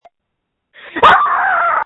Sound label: screams